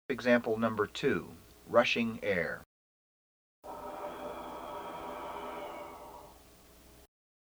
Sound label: Emb TUBA